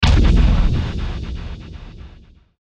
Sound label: Hit Rhythm Delay Filter; Blastwave